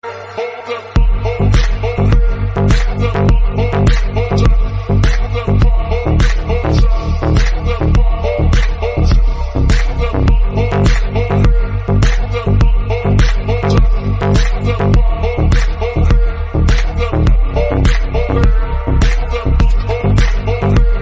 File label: Instrumental Music; Ringtone